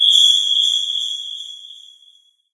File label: amb rand abstract hi; source engine; portal2